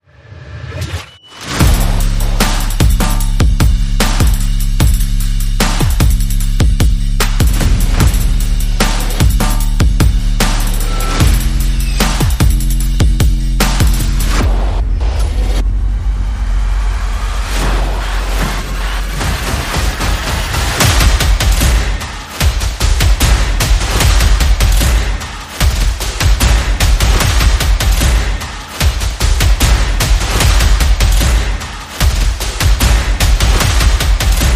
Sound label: Percussion Bass and Effects